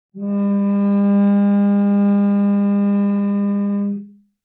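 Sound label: Cello arco fortissimo sul C G3; MIS Pitches - 2014; Strings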